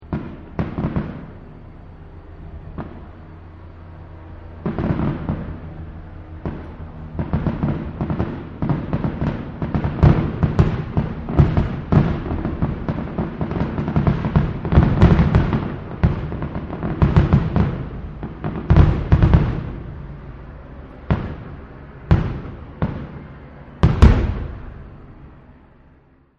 Firework, Fourth Of July Multiple Heavy Fast Loud Firework With Distant Air Traffic